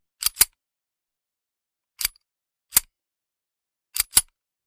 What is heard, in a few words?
.45 Colt: Slide Pull / Release; Several Cock-releases Of Colt Automatic Pistol Slide. Close Up Perspective. Guns.; foley gun movement